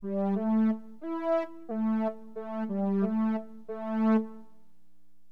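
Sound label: slow lead, keyboards; HipHop; LiveAct Pool 1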